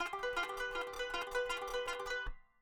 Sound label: kanun